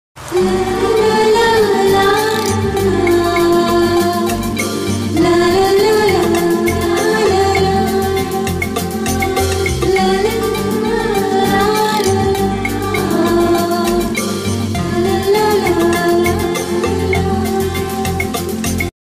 odia bgm ringtone odia bgm instrumental odia sad ringtone broken heart bgm